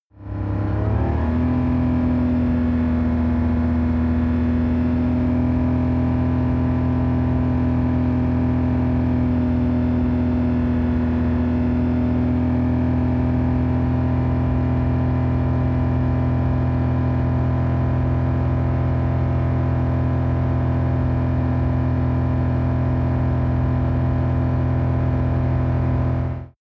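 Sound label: sports car interior engine drive constant medium speed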